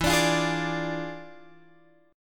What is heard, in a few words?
E 7th Flat 9th E down Guitar Open G